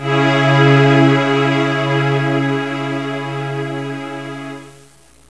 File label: QUARTET C; MUSIC